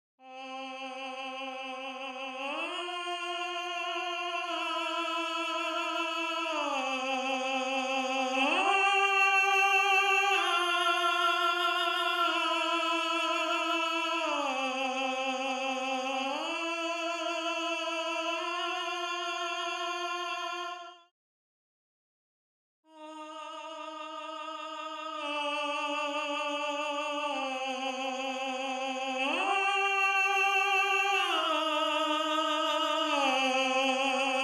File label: Tenor, counterpoint